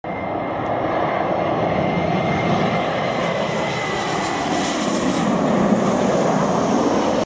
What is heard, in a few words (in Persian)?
صدای هواپیما, Sound of Airplane; بلندی صدای هواپیما بسیار زیاد است، بطوری که صدای گذشتن آنها باعث ناراحتی گوش ها و غیرقابل تحمل .است